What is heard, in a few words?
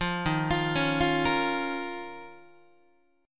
D sharp 7th Suspended 2nd, Guitar; D#7sus2 arpeggiated; JGuitar